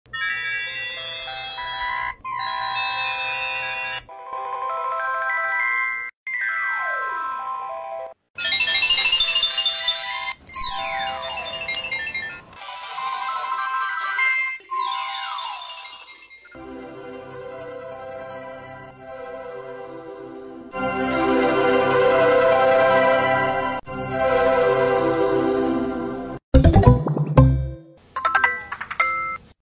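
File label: Motorola, ringtone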